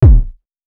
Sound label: BD kick